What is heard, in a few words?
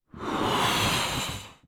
foley balloon inflate blow up quickly; zapsplat